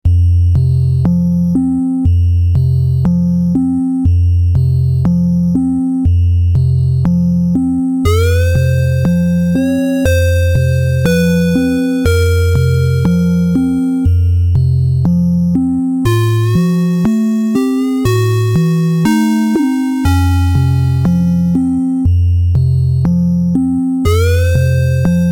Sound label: dark dungeon; freepixel